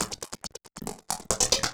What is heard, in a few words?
percussion loops, Techno, 138; PS-45 WAV Techno ID